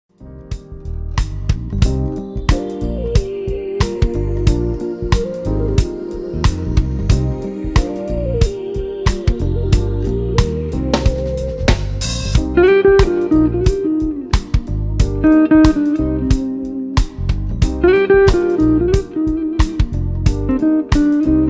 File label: guitar crate